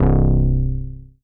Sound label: BASS, C; Melodic One Shots; Cranberry